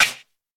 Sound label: muffled hi hat D key; drum single hits one shots; hat samples